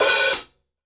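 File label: open close, hi-hats; Sampleheads; New York City Drumworks VOL-1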